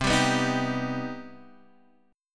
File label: C Minor Major 7th, Guitar, Open G; down; chord shape